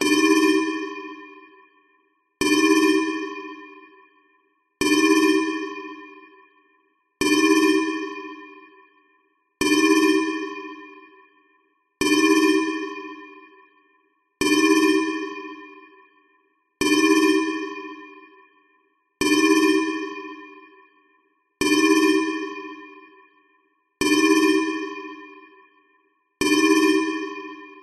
science fiction alarm big warning meltdown beep large reverb slow